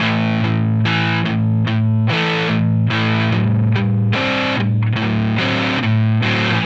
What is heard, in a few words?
Dist Gtr